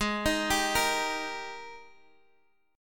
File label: G sharp Major 7th Suspended 2nd, Guitar, Half Step Down